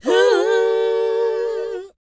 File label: WHOA F, Vocal R&B & Dance; ILIO; Vocal Planet